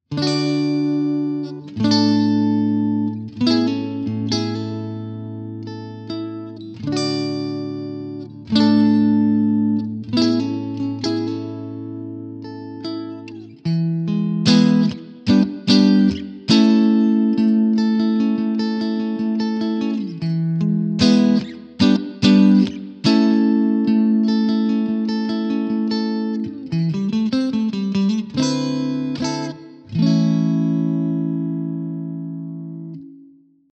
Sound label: clean; jensentone